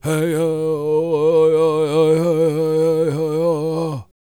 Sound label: World Voices, CHOCTAW; Spectrasonics Vocal Planet CD5